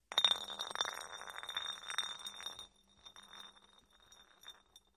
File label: Glass Drops Rolls; bottles